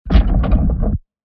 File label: Metal sliding door